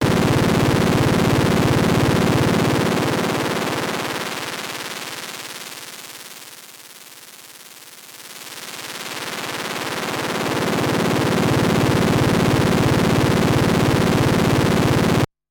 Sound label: Korg MS20 LFO Max HP Sweep